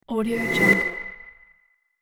transition sweep sharp high; transitions and movement

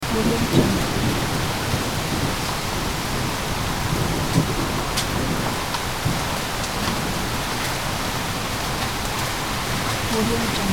medium hail storm at village; nature sounds

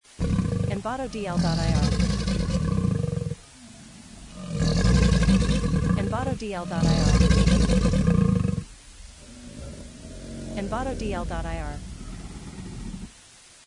Alligator Growls; nature sounds

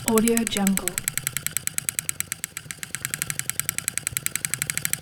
synchronizer spin 35mm film rotating with break; interface sounds

